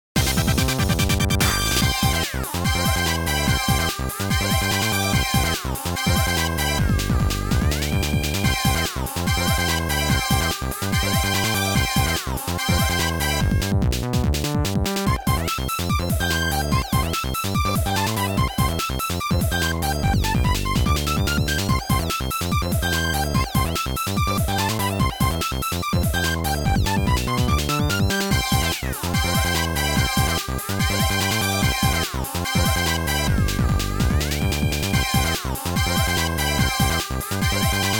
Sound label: Victory Song; bomberman ds